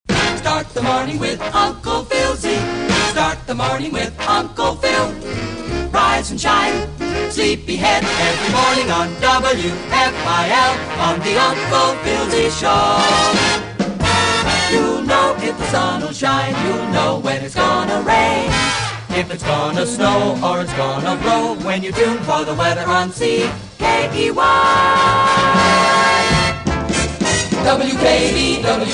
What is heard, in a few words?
Jingles; NBMS; Jurassic Jingles Volume VI